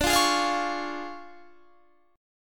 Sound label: D Minor Major 7th Flat 5th, D, Guitar Standard; DmM7b5 strummed